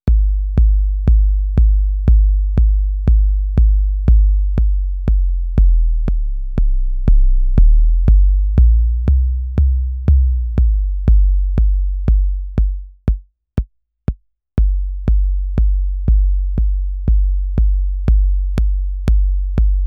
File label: 808 kick sounds no compression